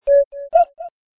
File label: kooky Short